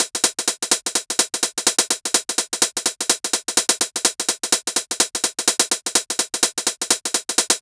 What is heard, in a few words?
Tech House Kit 126bpm Hihats; EDM Drums; Drum Kits; Stems; Ghosthack; Ultimate EDM Drums; Tech House Kit 17